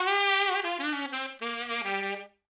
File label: OLDSCHOOL BRASS 96